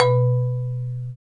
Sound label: Bass Marimba